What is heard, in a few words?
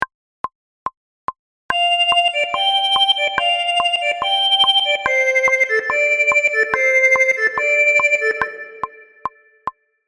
Intro Lead